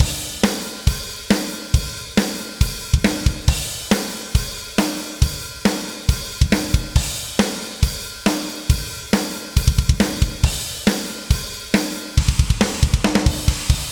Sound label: Drums and Jingles Battle Drums; Negras; Metal Tales Fury of the Guitar Gods